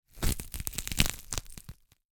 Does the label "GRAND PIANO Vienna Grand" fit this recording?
No